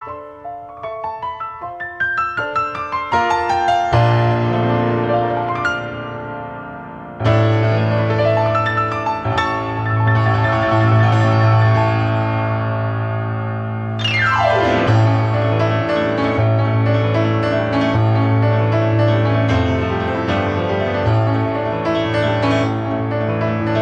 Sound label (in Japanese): ファンファーレ Fanfare PIANO